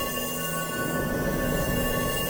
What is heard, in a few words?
chaos orb loop; LoL; leblanc